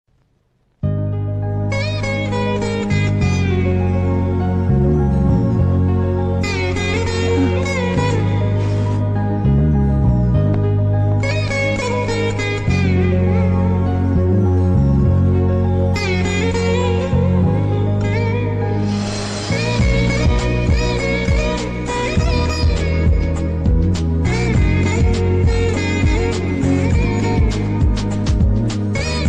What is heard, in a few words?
song bgm ringtone lovely melody; kushi movie